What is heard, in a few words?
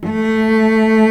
A2 CELLO; InVision Interactive; Lightware VOL-1